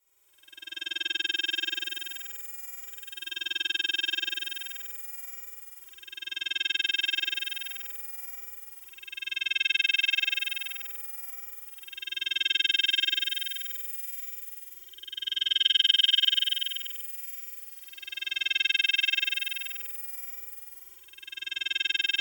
hologram sequence; energie

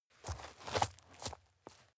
step dirt heavy boots scuff soft; menschen; schritte

